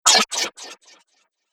Laser Echoes; Laser Sound; Sci-Fi Laser; Andrew Fleming

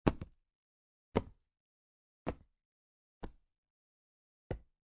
Cards Playing Cards Dealing On Felt; Playing cards, poker, dealing cards on felt. Dealer taking cards from hand and putting on felt.

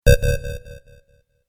Sc-Fi Generic Game SFX; Tech; Stuart Duffield

